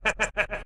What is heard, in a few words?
hero laugh; ludum dare 46; Player